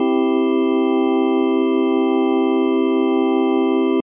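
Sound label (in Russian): орган аккорда до мажор c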